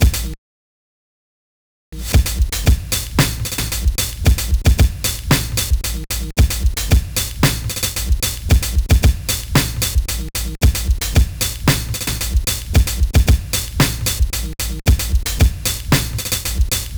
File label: drums; Pony Fan Music Remix Stems